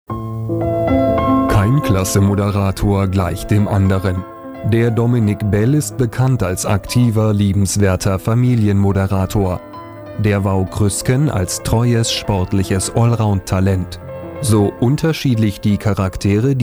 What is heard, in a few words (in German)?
Jingle; Royal Netradio II